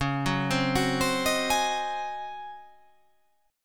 Csharp Major 7th Suspended 2nd Suspended 4th Csharp down, chord